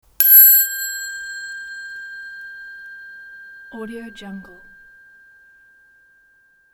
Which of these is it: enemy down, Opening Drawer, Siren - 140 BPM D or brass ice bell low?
brass ice bell low